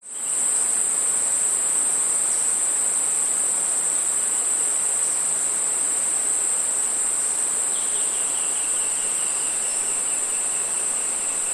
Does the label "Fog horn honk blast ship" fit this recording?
No